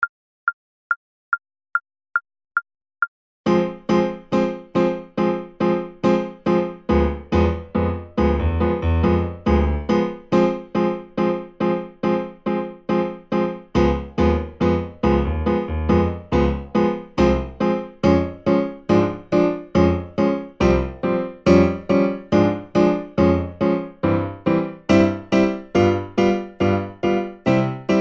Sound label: Charleston 70 Secondo; Seiber